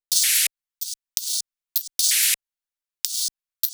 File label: drum loop 128 Top; Anthemic